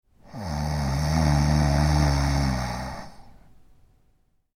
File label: Snoring Male Snore; LYN116 package of human sound effects